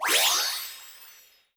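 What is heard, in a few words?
Support Item Window; disney star smash android ios mobile gamerip 2020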